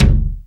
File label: MID TAIKO; Back In Time Records; Big Bang; THE WORLD